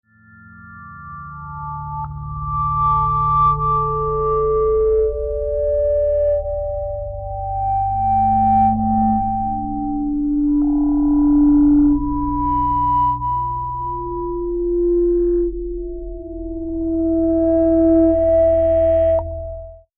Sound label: Click Melodic; Beds